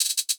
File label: Closed Hat Trill Bouncy, 808; 808 Closed Hats; Slate; Vintage Drum Machines Volume 2 808 Edition